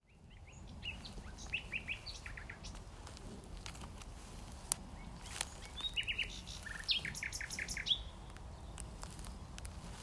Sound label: Nightingales; knepp